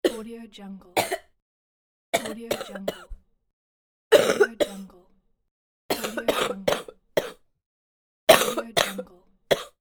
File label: female coughing